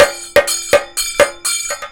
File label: KATAK, Indian Traditions